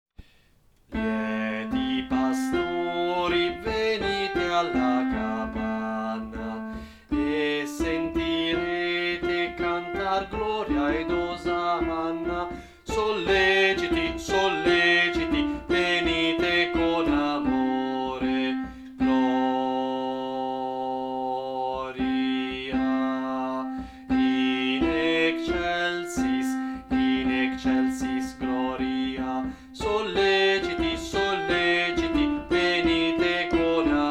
contralti, coro; Coro Isola del Giglio; Natale; Lieti pastori

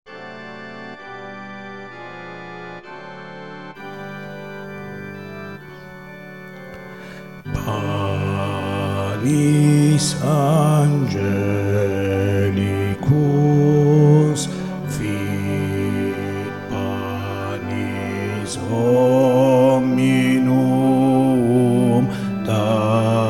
bassi coro; Panis Angelicus

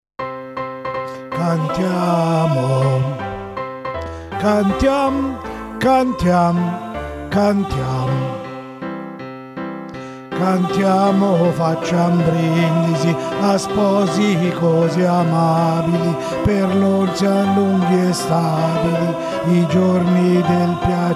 Elisir d amore Contralti

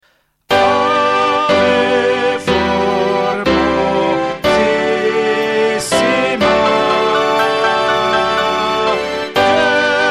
baritoni; Carmina; Ave formosissima

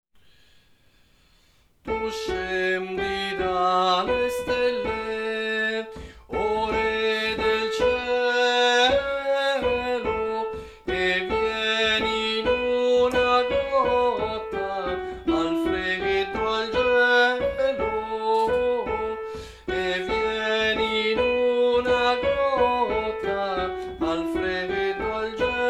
Tenori, coro; Natale; Tu scendi dalle stelle

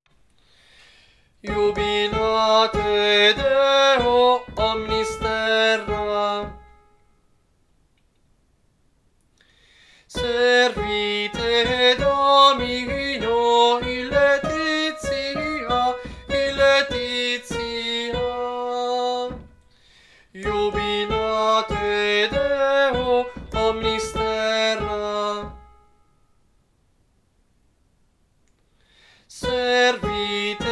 soprani, coro; Coro Isola del Giglio; Natale; Jubilate Deo

